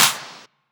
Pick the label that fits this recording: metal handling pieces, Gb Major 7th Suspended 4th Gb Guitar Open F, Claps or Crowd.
Claps